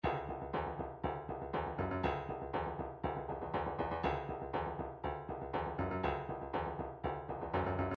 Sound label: drums, midi drum patterns; midi drum patterns vol 1 to vol 4